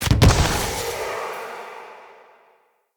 lantern balloon destroy; Objects; Ode Lantern Crate

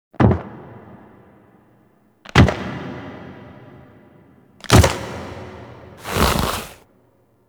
Foley End Steps; Cinematics; Ballas; Warframe; TheNewWar; Throne Room

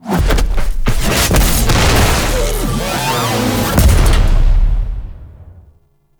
Finisher B; Jackal Redux; Cinematics

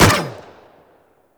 Energy Rifle Fire; Warframe; Venus; Venus Spaceman Carrier Agent

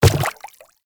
Quadruped Medium Walk Water, Footsteps; Warframe; Infested

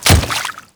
Water Step; Enemies; Footsteps; Warframe; Grineer Royal Guard

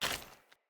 Foley Action Armour Run; Characters; Mother Armour

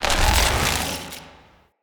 Galvanic Button Power Up

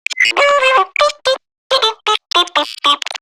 Garden Drone Vocal; Zariman Gardener Drone; Warframe

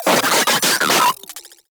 Moa Vocal Death; Warframe

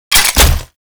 Shotgun Mech; Veilbreaker; Kahl

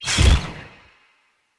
Charged Punch Whoosh; Weapons; Unarmed; Fey

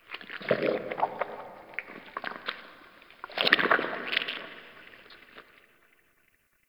Digest Interior Medium, Ambience; Warframe; Sentient Lair

